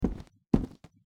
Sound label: Heavy boots walking